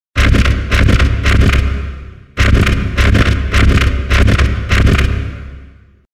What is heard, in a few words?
Robotic footsteps